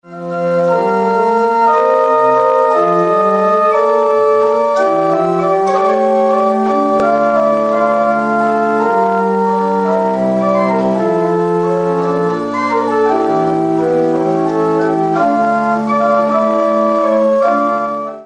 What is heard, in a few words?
organolite